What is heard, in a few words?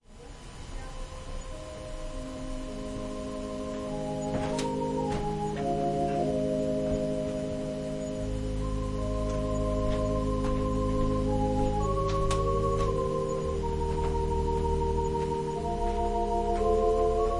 Organ prelude